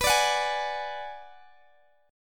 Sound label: B-Minor 6th B down Guitar Open G